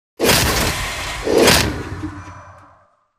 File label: grab arc tool away gun open; Titanfall 2